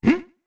Hm; Clock Town Swordsman: "Hm?"

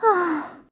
Happy Sigh; Zelda making a happy sigh; Skyward Sword

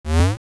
Web Bounce; Bouncing off a spider's web (or Armos walking)

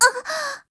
Caring Gasp; Peatrice (caring) gasping; Skyward Sword; zelda

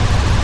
Roll Slow Loop; Goron Link; Zelda; MM

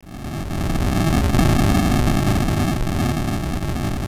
Quake; Quaking as a cave rises from the water

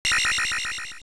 Sword Shoot, Sword shooting magic; also used for picking up items; AOL

